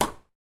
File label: tennis racket